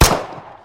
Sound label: shoot